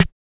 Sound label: SRB600 SD